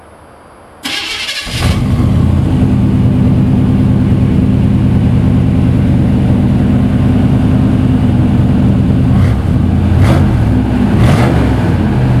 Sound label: Exhaust